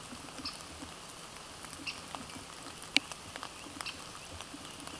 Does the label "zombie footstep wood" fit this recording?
No